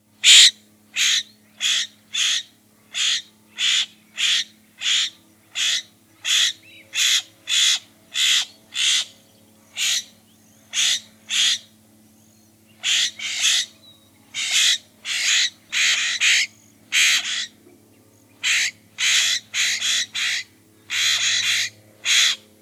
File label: Florida Scrub Jay Alarm mobbing calls to owl decoy